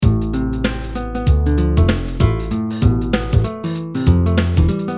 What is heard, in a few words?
second Hats; goody Melody Assistant Album